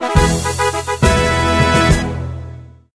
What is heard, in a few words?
Result Win; Summer Party Time